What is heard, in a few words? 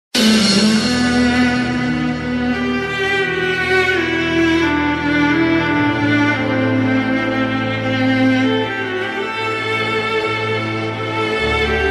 Sad BG Music; Ek Hasina Thi